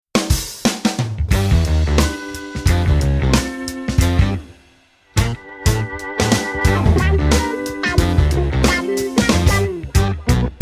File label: Bari Short